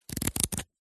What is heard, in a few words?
household book workbook pages flick through